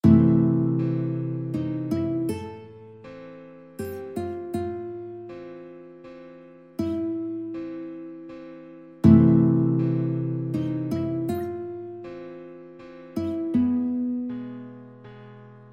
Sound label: sicilian don guitar